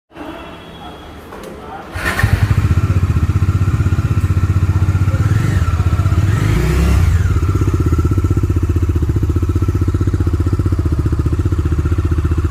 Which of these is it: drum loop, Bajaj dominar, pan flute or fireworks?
Bajaj dominar